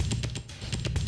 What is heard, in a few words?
125, Classic Drum Loops